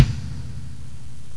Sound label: Drum